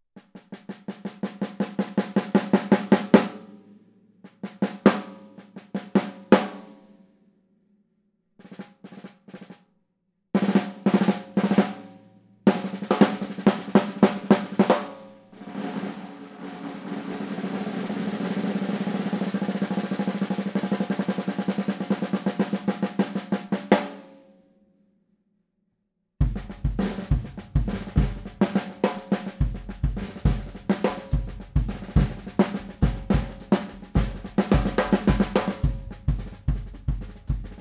5x14 Supra; Ludwig

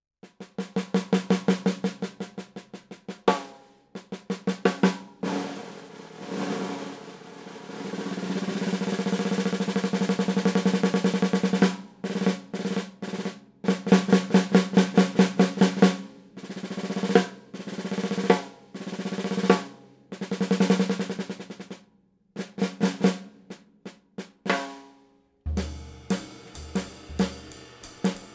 Gretsch 5x14 Spun B

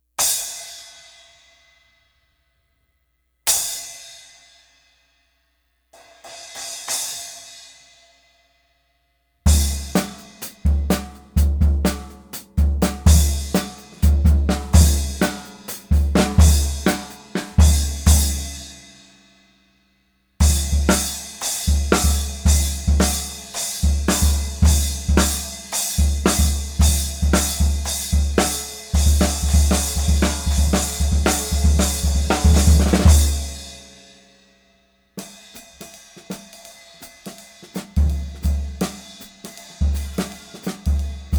Sabian 11 Proto

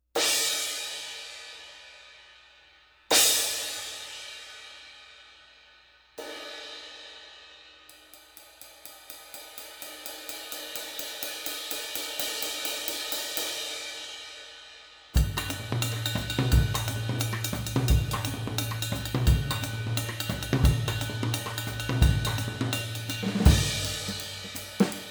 18 K Ist, Zildjian

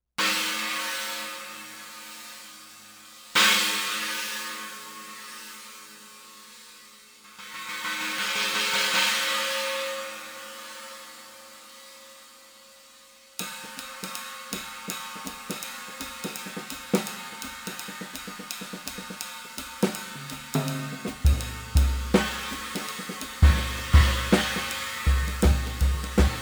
22 A Swish, Zildjian

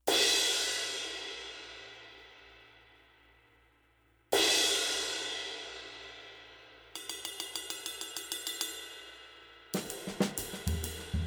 20 paiste Trad

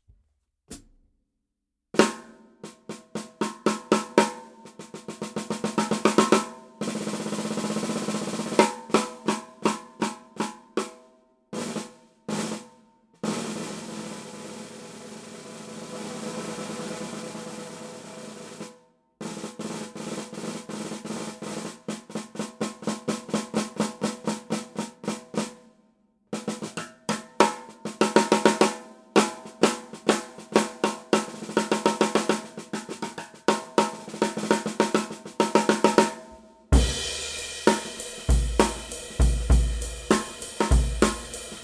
6.5x13 birdseye maple; Heavy

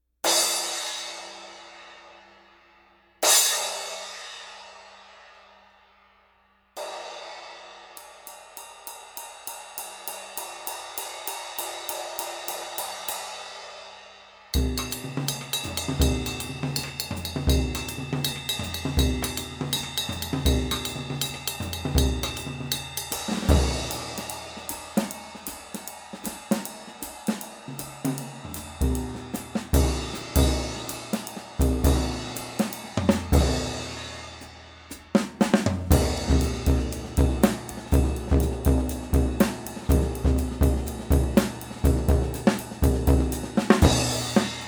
17 Paiste Ludwig

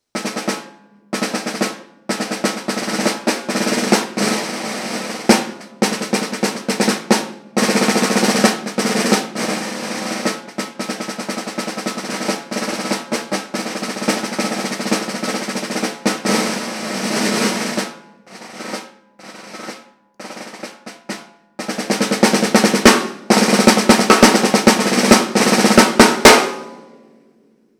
5x14 wood dynasonic; natural wood; fully original wood dynas; fully original wood dynas are significantly more expensive

